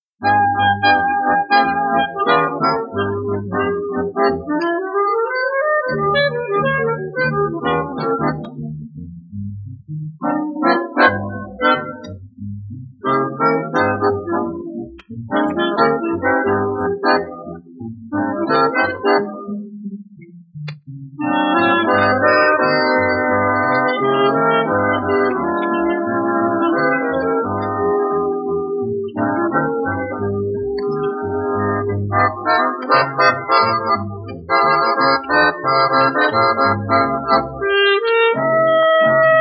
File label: JUNGLE DRUMS; LP Records